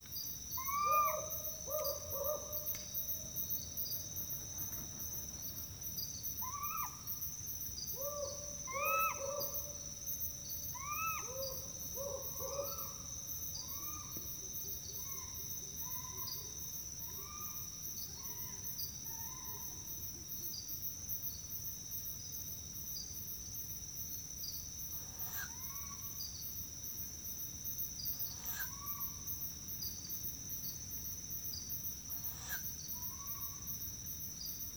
Humes Owl Male Female Nestling Encounter With Various Calls Including Pulsed Hooting